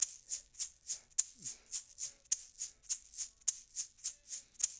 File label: percussion loop